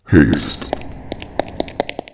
haste

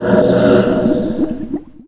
air out

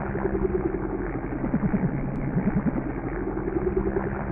flight

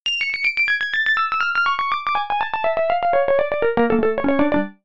synthpiano termollo

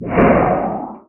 health

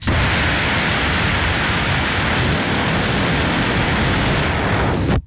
Flame Thrower Run; Rust; DJ-Tools

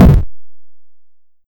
explosion; shortbow

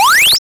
spawn; shortbow

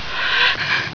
jump

